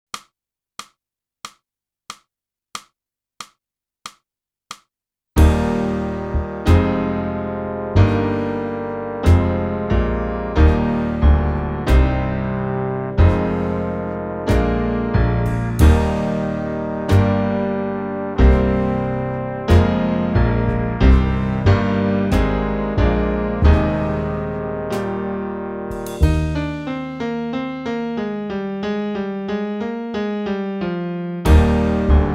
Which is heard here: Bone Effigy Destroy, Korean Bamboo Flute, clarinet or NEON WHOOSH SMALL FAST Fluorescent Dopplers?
clarinet